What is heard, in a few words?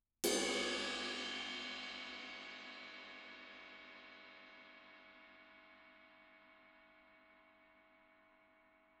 dark crash, drums; film